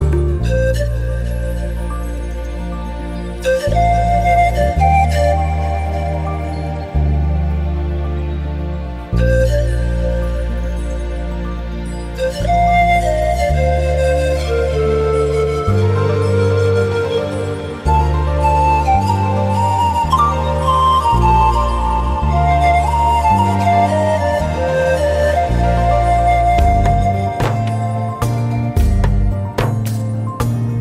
FLUTE RINGTONE

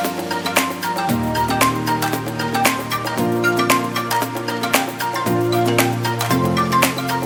VIVO X FOLD PLUS RINGTONE

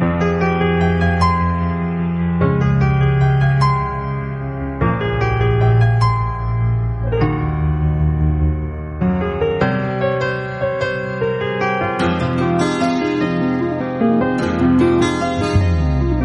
INSTRUMENTAL RINGTONE

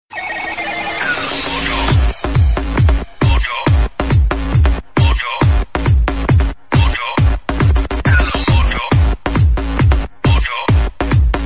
MOTOROLA LATEST RINGTONE

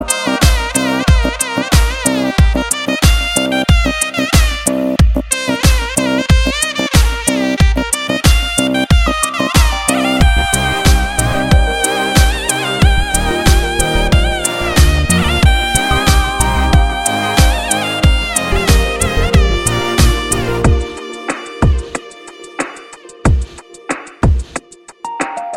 INSTRUMENTAL RINGTONE

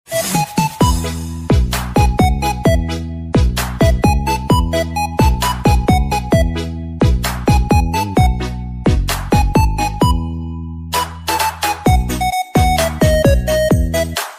instrumental ringtone; SHAUN THE SHEEP

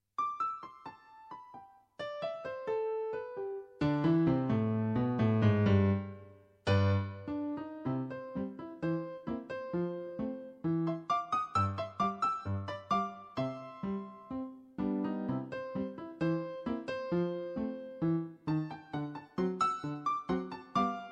piano ringtone

